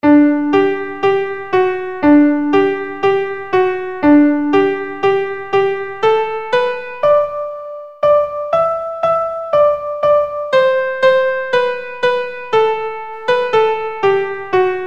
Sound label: carrying the load melody